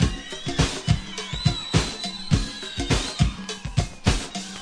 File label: with crowd, 104 bpm, drum loop